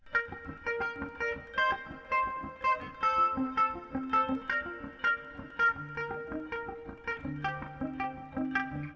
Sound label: triads